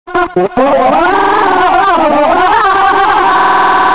rage pyro

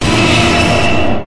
stun; slender

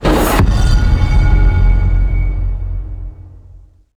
despawn sound; chiabihamaru; cv project; invading executioner; zerosiki; slender

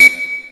beep block beep; tf2ware ultimate

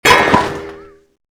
music box spawn; zerosiki; eltra

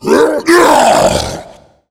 tank attack, voice

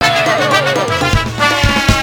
failure; tf2ware ultimate; music game; wii tinywario

